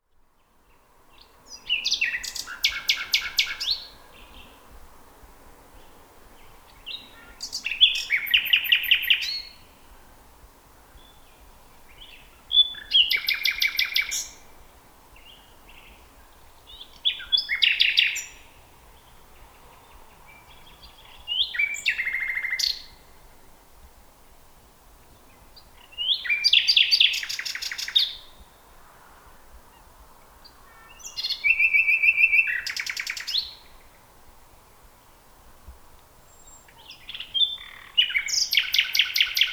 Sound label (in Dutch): Nachtegaal, luscinia megarhynchos